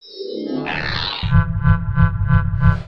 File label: Hologram Fire; Venture; ambience nexus tower